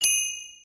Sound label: checkmark; sweet shuffle